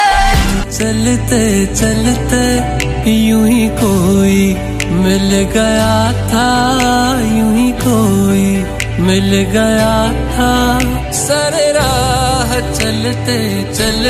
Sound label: bengali ringtone